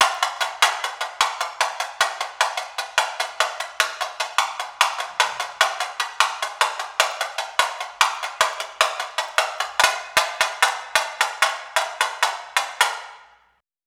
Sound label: tamborim nylon stick